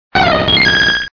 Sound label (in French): Cri